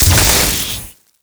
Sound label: red lightning burst